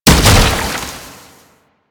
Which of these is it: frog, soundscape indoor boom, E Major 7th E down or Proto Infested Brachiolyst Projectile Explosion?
Proto Infested Brachiolyst Projectile Explosion